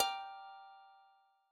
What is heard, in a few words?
chord